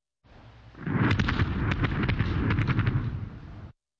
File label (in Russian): Udary v nazemnye celi